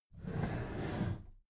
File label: packed drawer smooth clatter open soft halfway